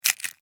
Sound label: Insect, Claw, Pinch, Snap, Movement, Bug; Sound Effects:Animals:Insects